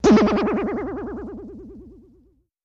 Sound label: Bing, metallic, insecty; bings